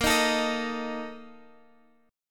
A sharp Major 7th Suspended 4th, down, Guitar, Standard; strummed; capo=2